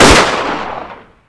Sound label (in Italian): sparo, armi